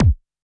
Electro Kit Kick

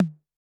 Tom, Electro Kit

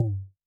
Electro Kit Tom

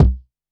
Kick, Electro Kit

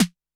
Snr, Electro Kit

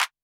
Electro Kit Clap

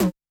Electro Kit Tom

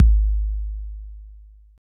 Electro Kit Kick

